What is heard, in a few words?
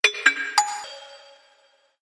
Reverberant Metal, notifications; Motorola Cliq